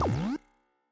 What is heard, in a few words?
wrong answer